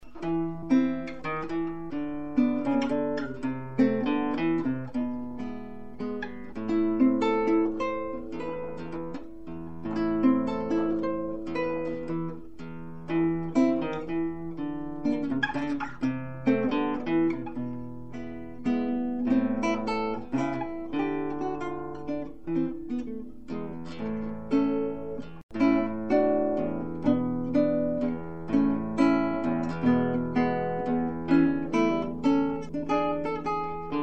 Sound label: mazurka, guitar